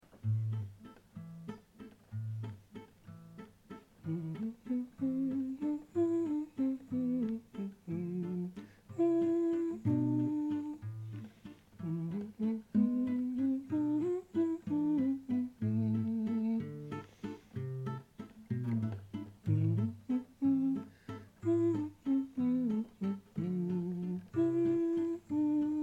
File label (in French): Accords Chant